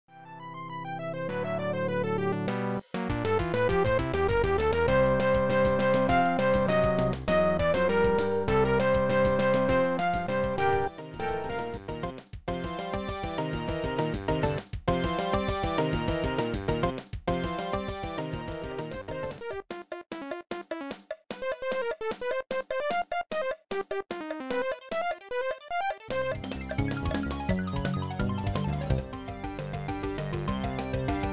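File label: cubase videogame mix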